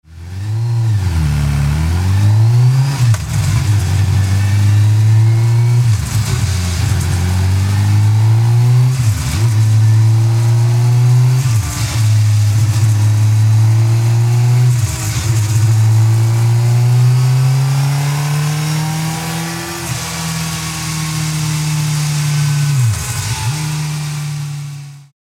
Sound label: HONDA CBR 600 F